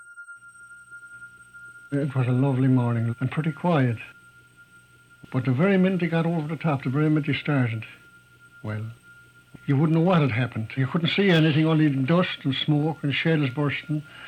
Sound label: Bugle Bell